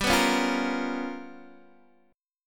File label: G 7th Sharp 9th Flat 5th, Guitar, Open D